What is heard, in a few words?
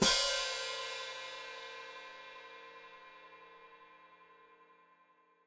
Hat Closed Open, Hi-Hat